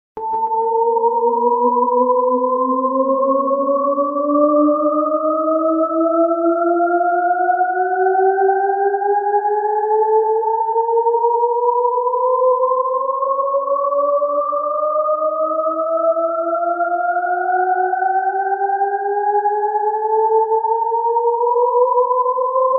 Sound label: Shepard Glissando